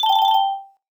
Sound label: quiz good